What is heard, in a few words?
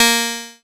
A#, PIANO; Big Fish Audio; Synclav FM